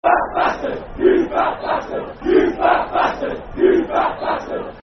You Fat Bastard football chant (soccer song); Brentford

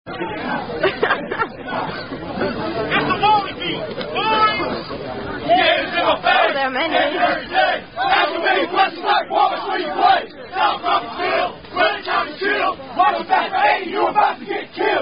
Fraternity Chant

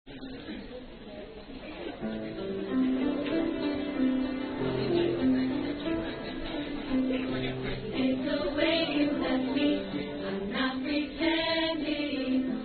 Sorority Song; Happyending Pref

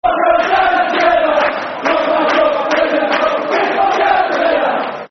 Go Down Together, Football Chants; West Bromwich Albion Wba; fanchants

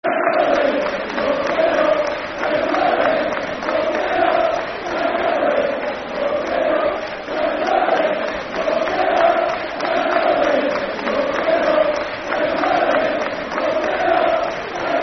Wembley Football Chant (Soccer Song); Bradford City Bcfc

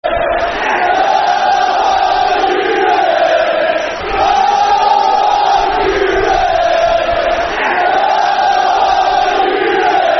Come On You Reds Football Chant; Crewe Alexandra Cafc

